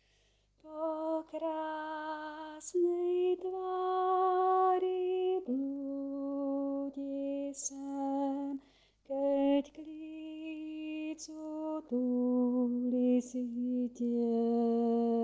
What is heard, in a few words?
Bass